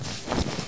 stand up

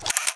scope back

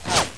bullet by

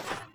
crouch

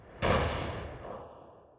dist grenade

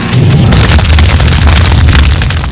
god flame

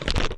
bone crack

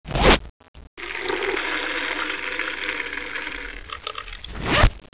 piss

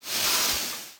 m2 overheat

